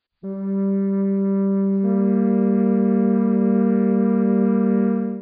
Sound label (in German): flöte